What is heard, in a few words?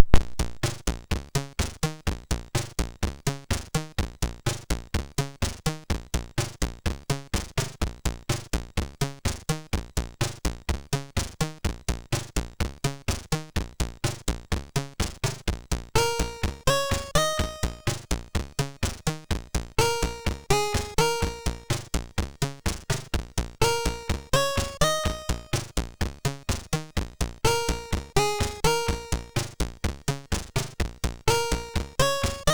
sid, MOS6581R3; Tiger Claw v4